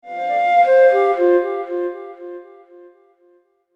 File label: A Brief Bright Interlude, music; Musical Sound Effects; audio logo, web background, video game music